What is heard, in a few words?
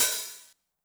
open hat MOD Tune, 909; classic machines; 909mod; OH - OPEN HAT